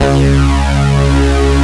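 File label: D50 synth bass; East West; Global Sounds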